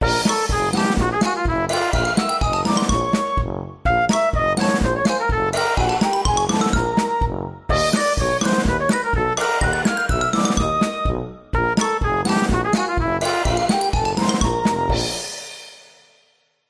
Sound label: music crazy clowns; zapsplat